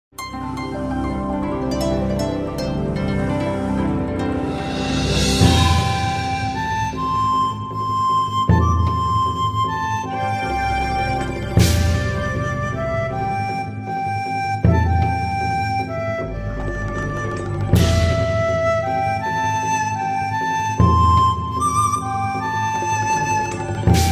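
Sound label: Percussion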